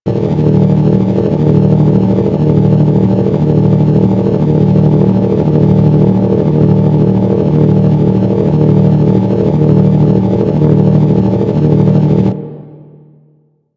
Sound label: Tractor Beam Generator Loop A; Crp Space Bridge Transition